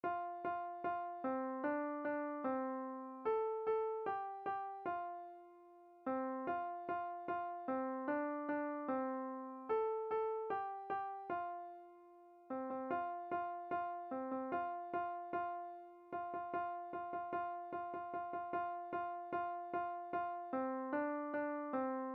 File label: play piano by ear; Old MacDonald